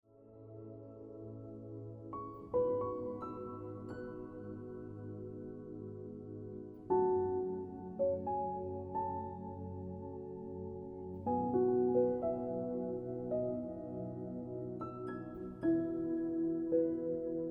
Looking Back Ringtone Piano Music

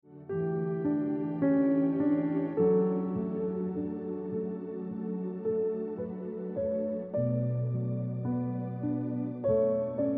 Look Back On Ringtone Piano Music

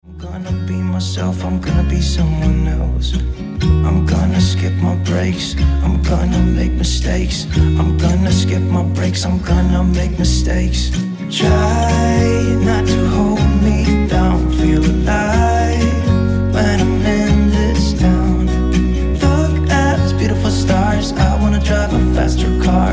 Acoustic Version

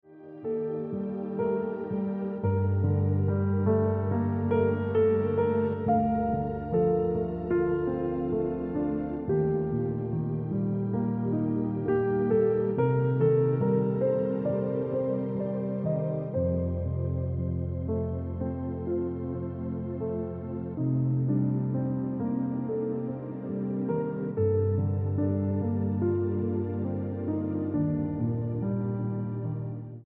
Piano Music

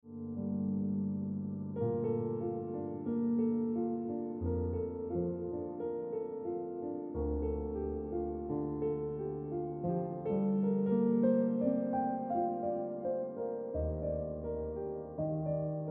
Piano Music

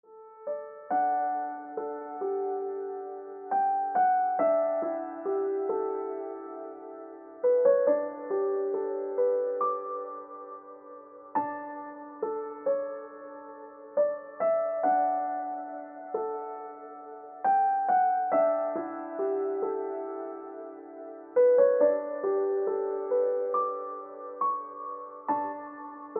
Ringtone Piano Music

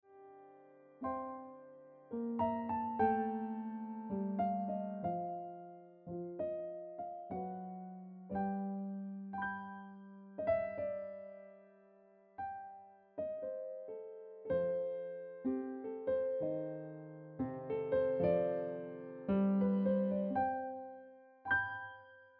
Cool Breeze Ringtone Piano Music

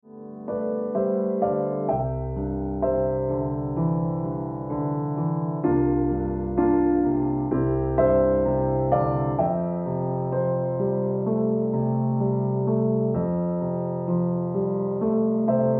Early Sunshine Ringtone Piano Music

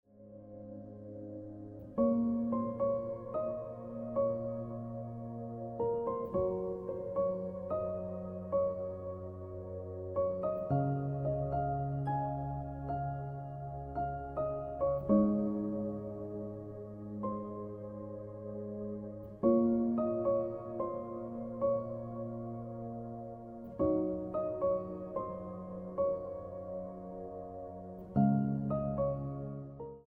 Celestial Ringtone

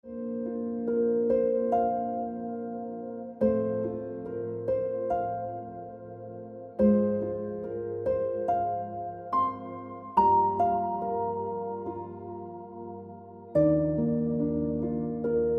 Jade Ringtone Piano Music

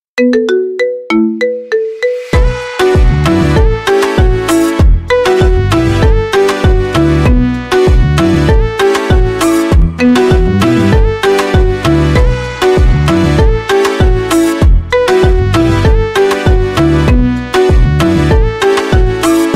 Kompa Marimba Ringtone

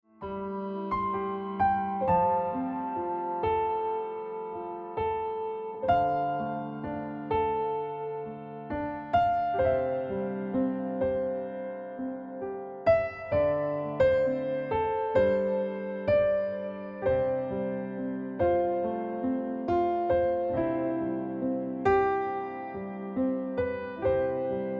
Ringtone

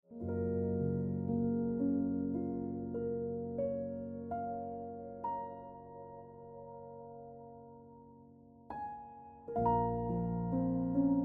Ringtone Piano Music

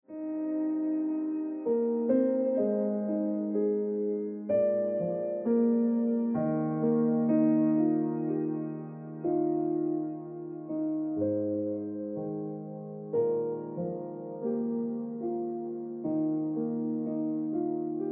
Like A Radio Song Ringtone Piano Music

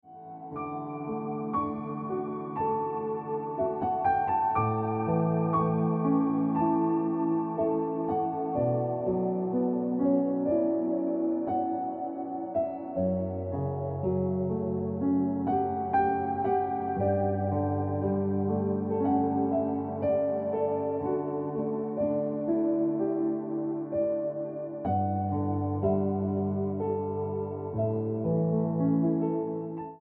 Ringtone

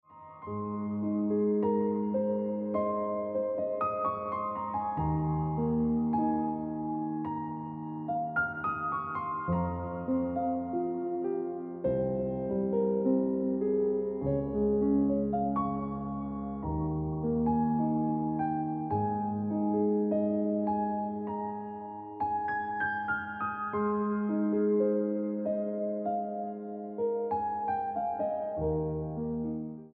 Deep In Mind Ringtone Piano Music

